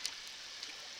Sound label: Background Noise Clips